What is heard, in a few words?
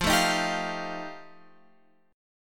F 9th F Guitar Open F, chord; strummed